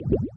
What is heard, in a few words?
Underwater